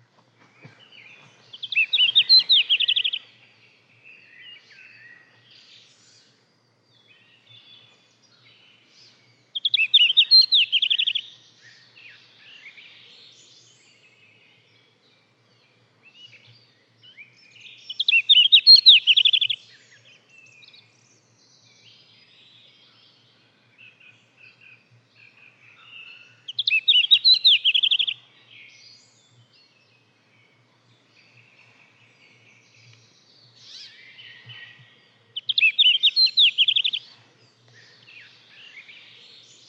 blastjart, fagelljud

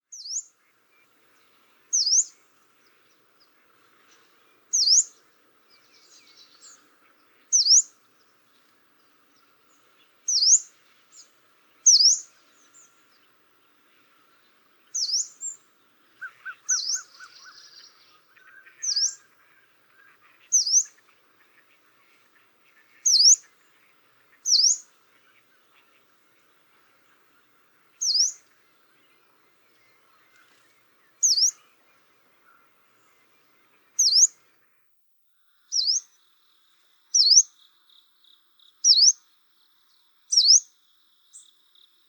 taigasangare; fagelljud

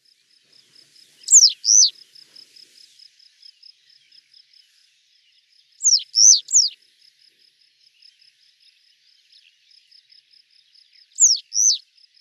citronarla, fagelljud

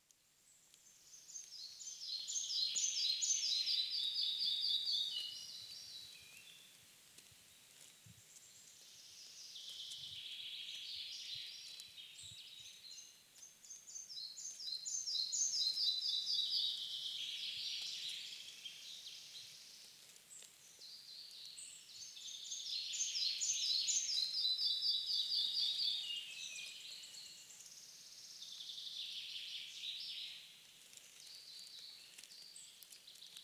mindre flugsnappare; fågelljud

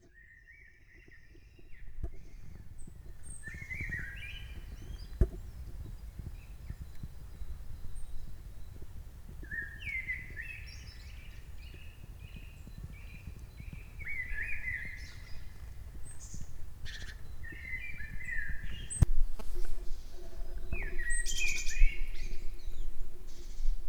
koltrast; Grimsvik; fågelljud

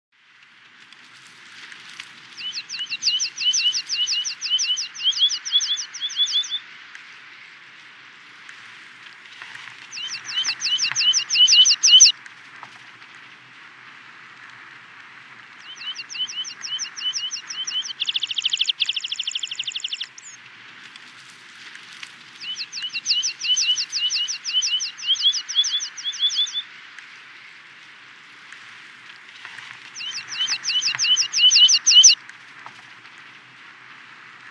rodstrupig piplarka; fagelljud

